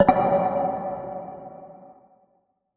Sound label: menu hit